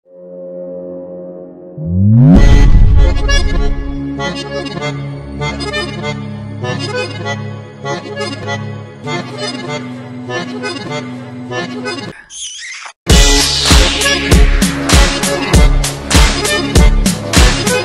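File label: background music ringtone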